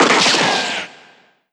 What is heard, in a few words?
Roland SC-88 Gunshot